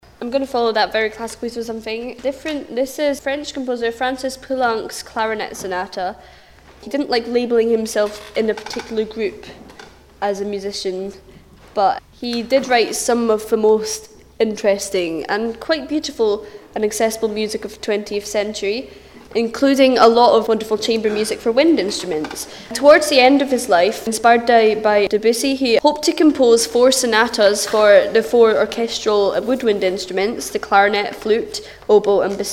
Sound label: intro sonata; recital; introducing a sonata by the French composer Francis Poulenc (1899 - 1963) 'Clarinet Sonata' written for clarinetist Benny Goodman